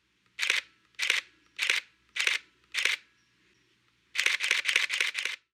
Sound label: Camera Shutter Fast And Very Fast